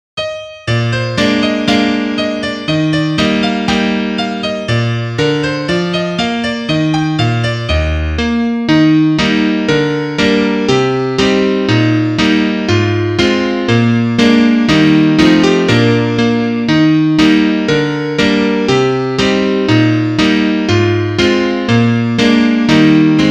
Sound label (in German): Klavier; butzemann